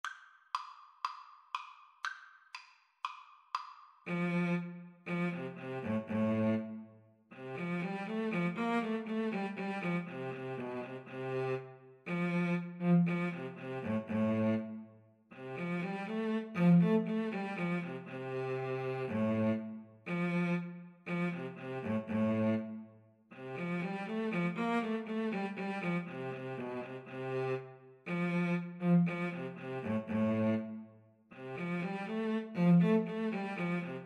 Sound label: Clarinet Cello Duet